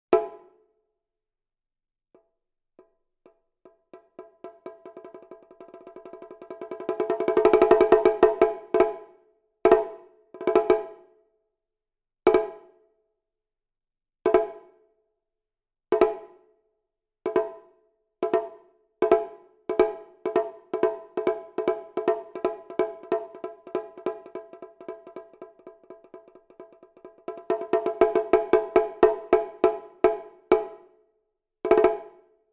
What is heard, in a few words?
taiko Shimedaiko Hit; fxpansion; Shimedaiko1